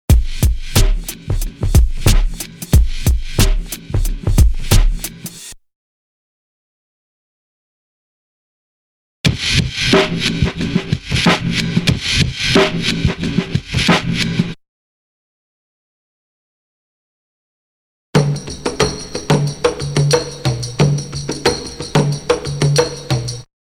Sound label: Drum loop; BEST OF DRUM BREAKS 30